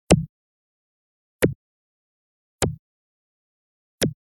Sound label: Generic Click Digital